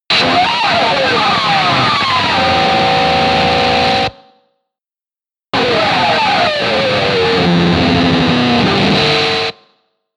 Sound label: distortion